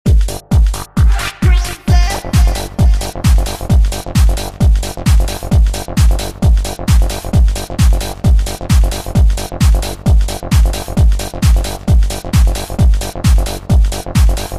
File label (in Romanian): muzica; Esportes